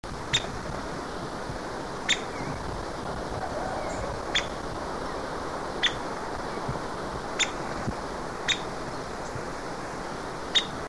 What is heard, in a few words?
Carpintero Bataraz Grande, Striped Woodpecker, Picoides lignarius; cantos